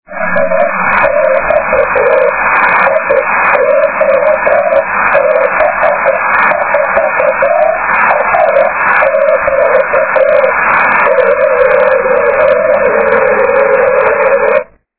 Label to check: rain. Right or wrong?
Right